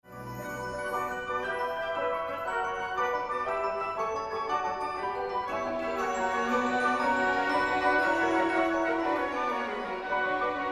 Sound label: gigue